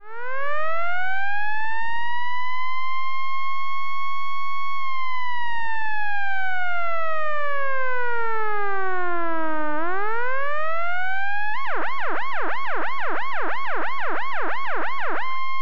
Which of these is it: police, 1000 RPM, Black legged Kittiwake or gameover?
police